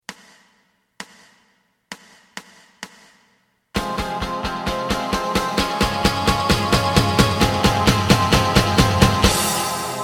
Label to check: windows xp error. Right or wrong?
Wrong